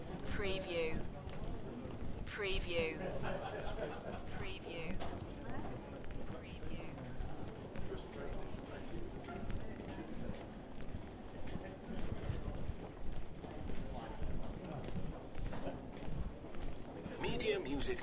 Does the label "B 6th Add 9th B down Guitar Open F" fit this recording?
No